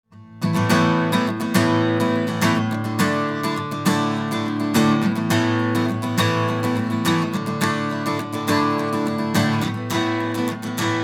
delay out in, Dry guitar, then add delay (out, in, out, in); Mixing for God audio examples